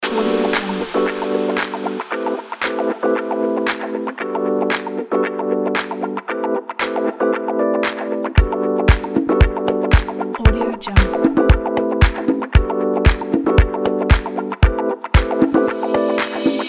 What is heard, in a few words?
lounge house